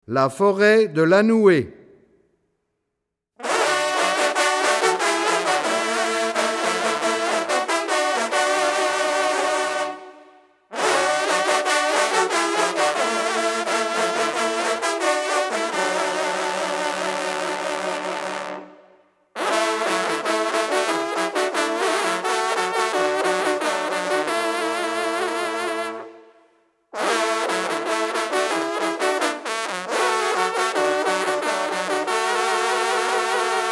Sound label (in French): fanfare; lanouee; bien alle nantes